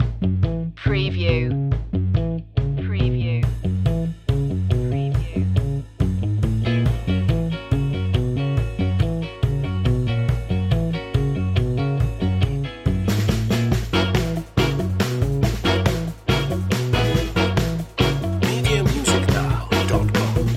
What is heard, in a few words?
old school detroit loop